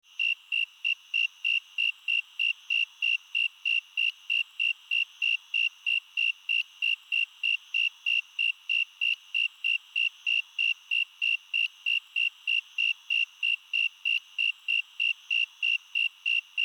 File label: snowy tree cricket